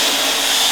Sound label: Goalie Catches Ball loop; FIFA Soccer '96